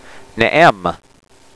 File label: arp; Neh-ehm'; Ne'em